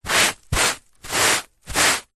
household cleaning wiping carpet with dry tissue paper x4 wipes; zapsplat